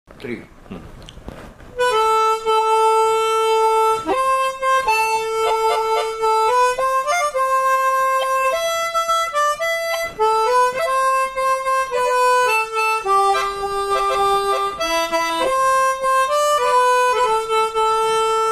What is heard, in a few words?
Harmonica song